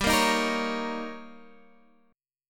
D sharp 7th G, guitar

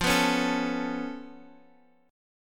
C sharp Augmented 7th F, chord

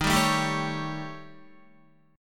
D sharp 7th Flat 5th, Guitar; strummed; JGuitar

